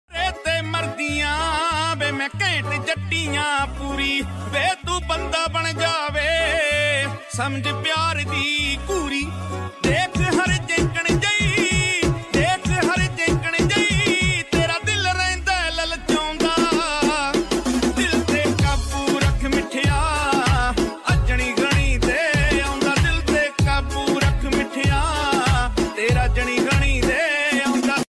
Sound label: Trending Punjabi Ringtone